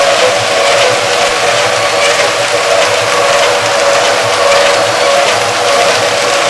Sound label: Orca Noise